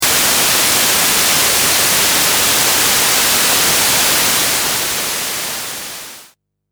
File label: Noise White